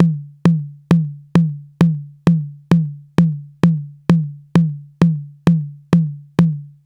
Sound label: hi tom, raw